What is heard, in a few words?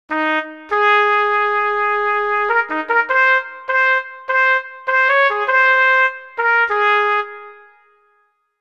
trumpet; kontakt player; sibelius